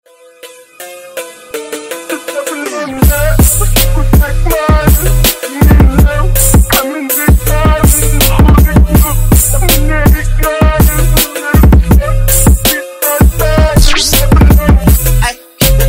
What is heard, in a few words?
phonk remix